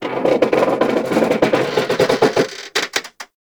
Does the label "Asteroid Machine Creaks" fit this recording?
Yes